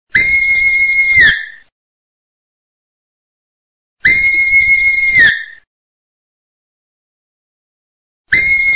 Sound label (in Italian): CAPRIOLO